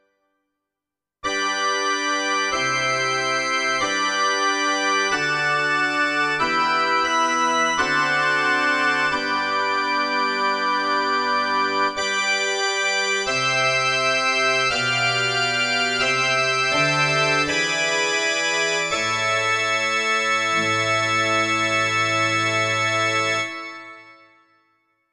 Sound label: Church Organ